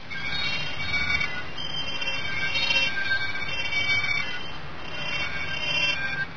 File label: squeeks; ambience